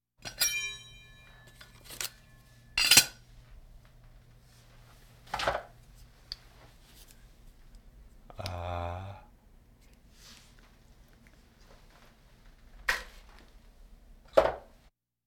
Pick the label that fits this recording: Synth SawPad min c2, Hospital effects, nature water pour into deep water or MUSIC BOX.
Hospital effects